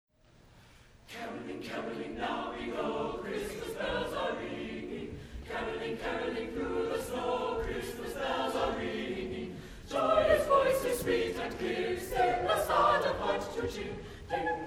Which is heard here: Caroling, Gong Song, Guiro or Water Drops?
Caroling